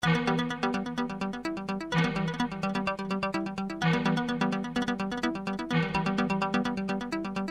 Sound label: ringtone short